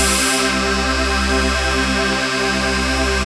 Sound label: SYN Time Jump; Best Service Gigapack 1